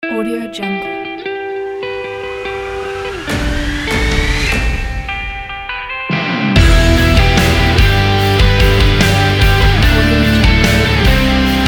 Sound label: hard rock alternative